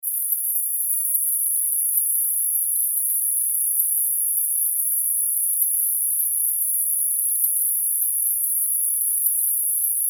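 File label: high frequent layer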